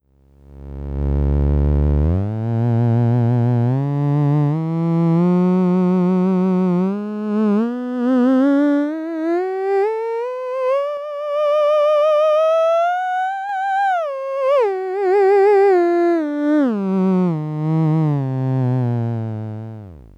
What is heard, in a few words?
tube voice, theremin